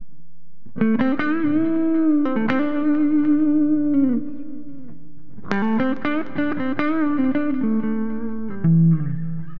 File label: cool guitar; AcidJazz Funk; LiveAct Pool